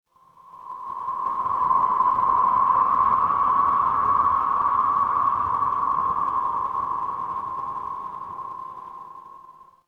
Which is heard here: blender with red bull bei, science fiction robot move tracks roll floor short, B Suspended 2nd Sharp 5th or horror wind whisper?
horror wind whisper